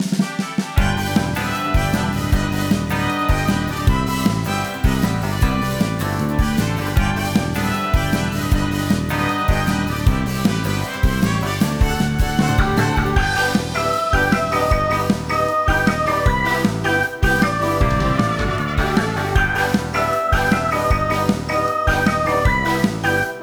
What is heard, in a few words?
Battle Boss; Eversoul; Android